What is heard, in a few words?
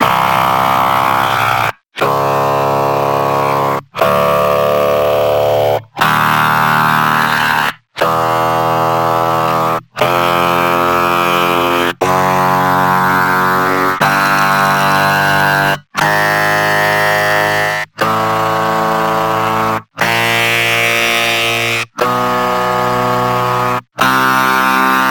Chromatic; Agoti